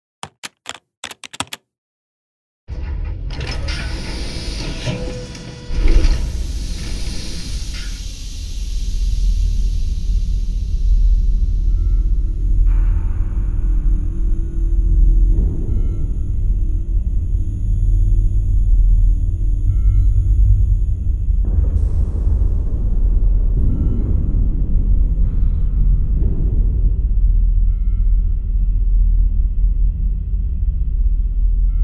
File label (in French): Le Vaisseau ambiance; sans izotope